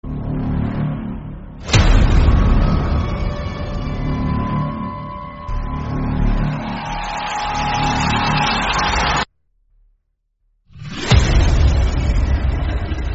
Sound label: extremely terrifying horror music